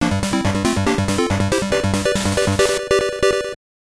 Jingle

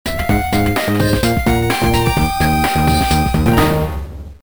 Win Bonus; Donkey Kong Country 3; GBA

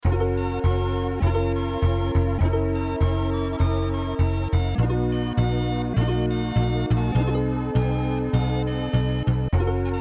Game Over; Diddy's Kong Quest

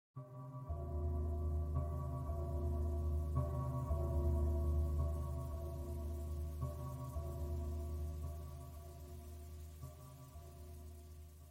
Lair Ambiance; Sea of Thieves

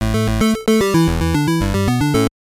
Start; Cobra Triangle

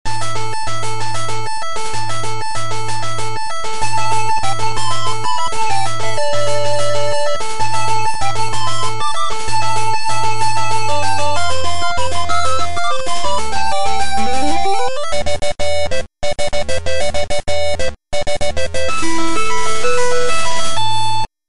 complete game

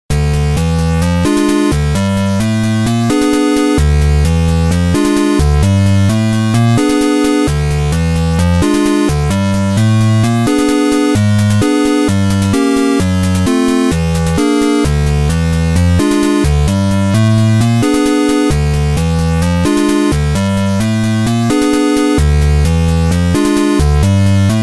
Water Elemental

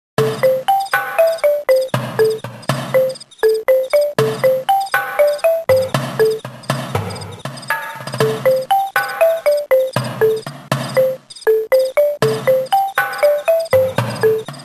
Chant; Chagas; Sabre Wulf

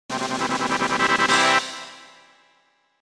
Race Win Fanfare

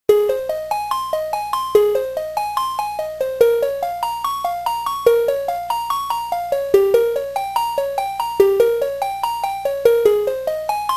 Melody; Sabre Wulf

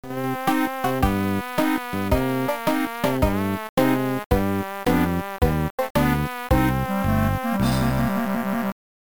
Gameover

